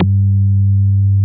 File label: Moog G2, analog bass; Music Store - Claudius Diemeter Schwerlos